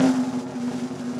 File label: SnareDrum; 膜鳴楽器; 打奏; SnareDrum-2RollPlay